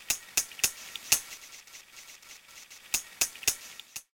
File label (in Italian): capodoglio